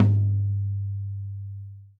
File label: Tom2 Softest; hydrogen drum samples